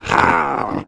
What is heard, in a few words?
scrm; The Elder Scrolls III - Morrowind; nix